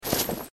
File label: equipment open